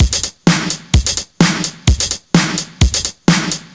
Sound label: House Beat, 128 BPM; Loops; Vengeance Samples